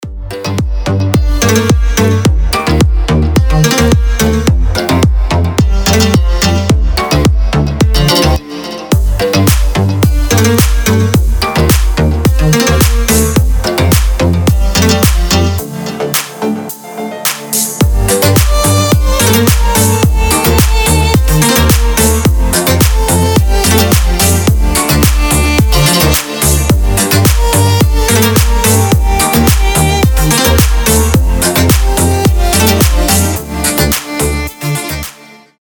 topovyy zvonok

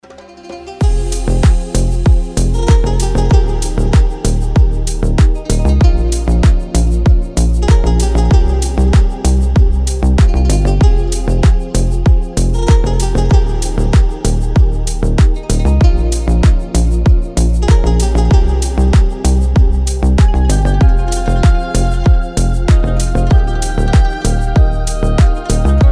vostochnaya muzyka

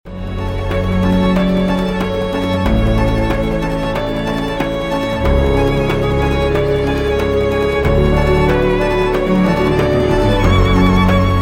grustnaya

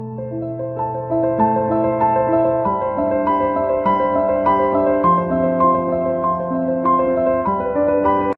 grustnyy rington na telefon; grustnye ringtony

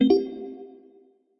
dostavlennogo soobscheni

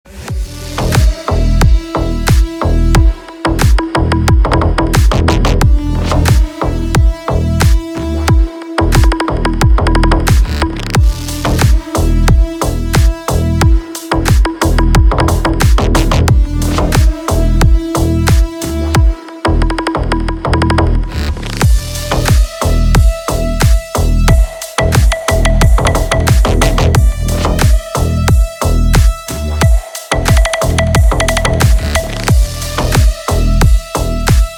moschnyy zvonok

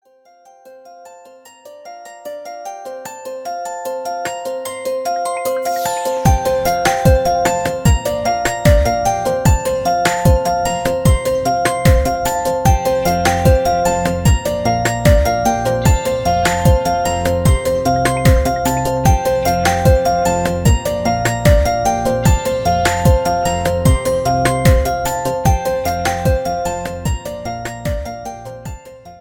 melodiya dlya budilnika ili zvonka; Топ мелодия для будильника или на звонок

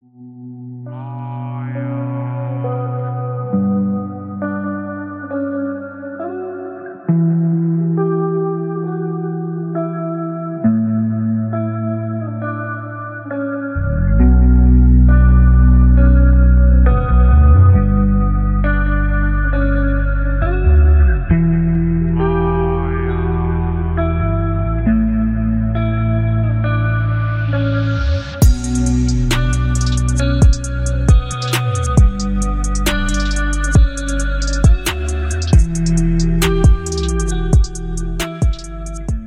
misticheskaya

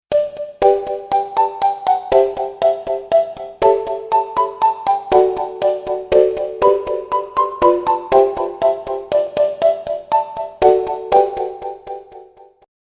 prostaya novogodnyaya muzyka bez slov; rozhdestvenskie i novogodnie ringtony

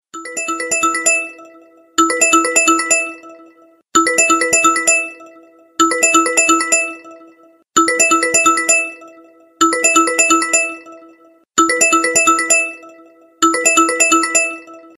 gotovyy standartnyy zvonok; Готовый стандартный звонок

